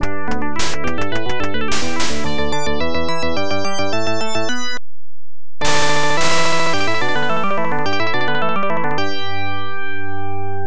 Round Complete; Shanghai

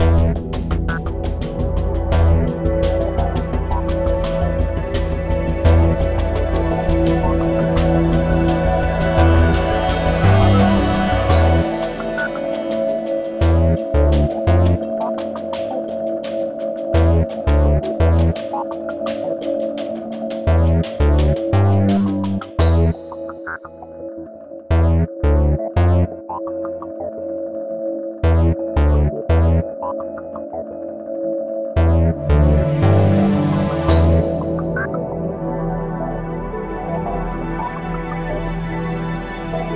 Tense

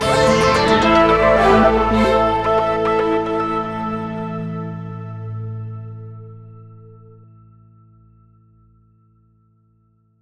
Win; escape academy

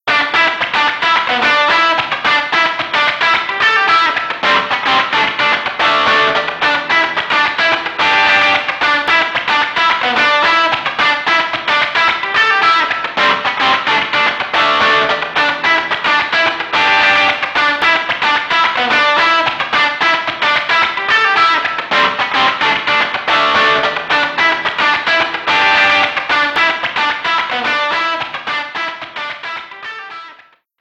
Guitar riff; yakuza kiwami b sides ps3 ps4 windows xbox one gamerip 2016

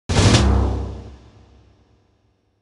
Collect Grenade Eggs; Banjo-Tooie

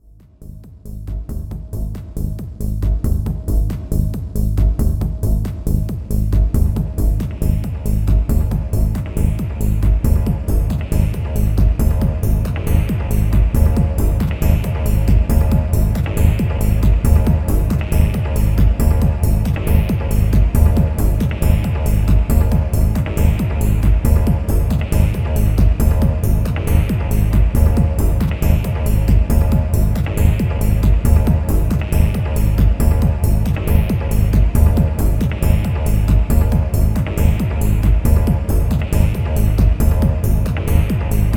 Hard Bassline

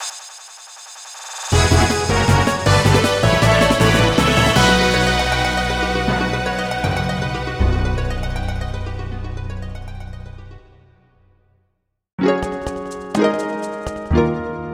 Boss Victory; tangledeep linux macos ps4 switch windows gamerip 2018

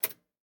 Button; miside demo windows gamerip 2023

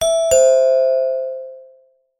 Entry bell

